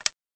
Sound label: click